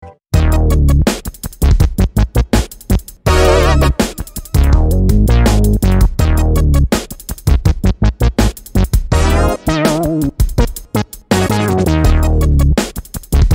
e funk synth

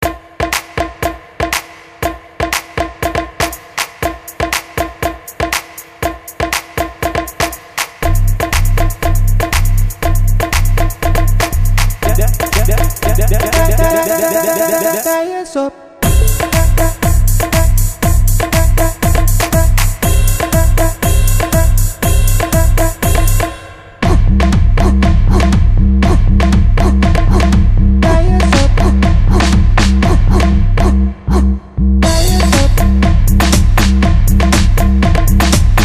tribal tek house

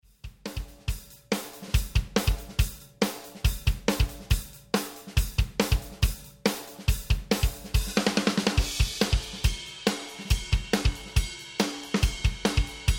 140 heavy rock full

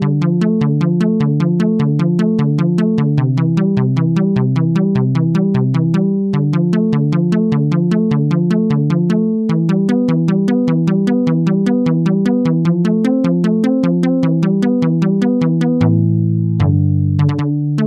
minimoog model d bass

